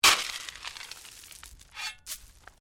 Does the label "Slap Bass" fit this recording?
No